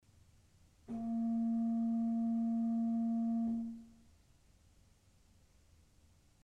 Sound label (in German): Principal 4' ais, ORGEL pipes